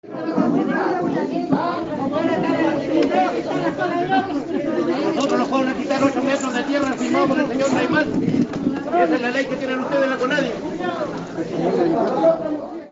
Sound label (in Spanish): ambiente